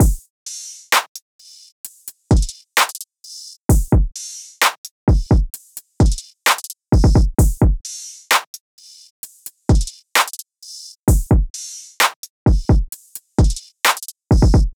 Full Drum Loop - 130 BPM, Hip Hop; Drums; Humble Hip Hop Sample Pack; Divine

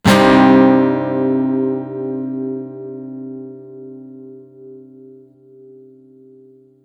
Guitar 128 BPM Cmin, One-Shots; Ghosthack Advent Calendar 2021 - Day 10; Guitar Loops and One-Shots

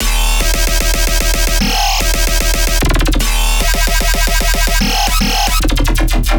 Bass Loop D# Stabby, Dubstep, 150bpm; Ghosthack; Dubstep Sound Arsenal

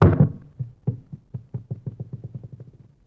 Dull Wood Hit Teeter; Vintage Cartoon; Drums & Percussion

